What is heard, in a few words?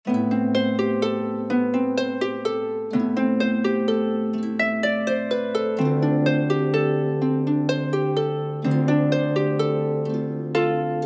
Game Menu BPM84 Am Harp